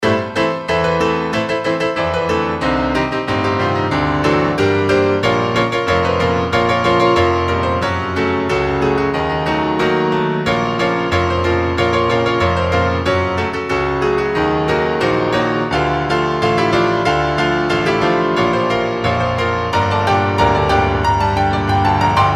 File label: piano cover